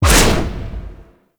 Melee Heavy Attack Short Whoosh; Warframe; Weapons; Whooshes

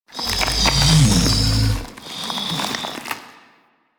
Venor Breath; Warframe; Infested Eris; Ambience

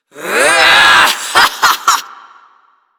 Ability Lift Laugh Lich

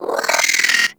Hyena Micro Vocal Angry; Warframe

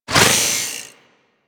Worker Grinder Whoosh, weapons; Grn Worker Grinder

